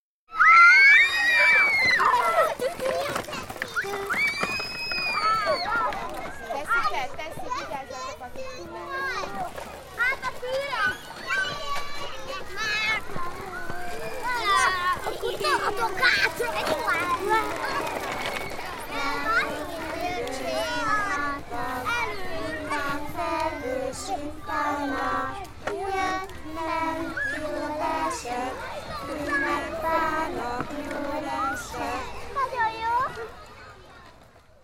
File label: Lively Children In The Aprófalva Kindergarden